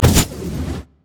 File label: mana flare